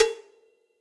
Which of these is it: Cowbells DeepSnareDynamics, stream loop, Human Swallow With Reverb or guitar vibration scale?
Cowbells DeepSnareDynamics